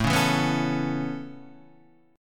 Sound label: A Major Add 9th A, chord; Aadd9 Chord {5 4 2 4 2 5} strummed